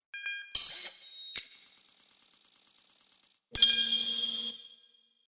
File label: computer scanning target